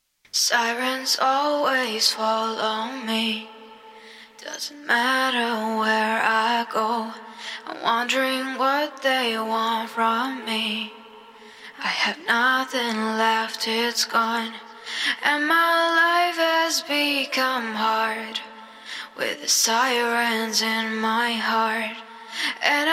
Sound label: pop 130 Am